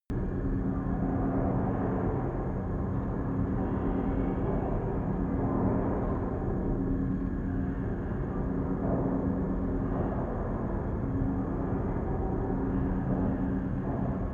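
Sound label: spaceship ambience loop, science fiction; atmosphaeren umwelt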